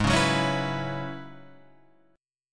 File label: Gb 7th Suspended 2nd Suspended 4th Ab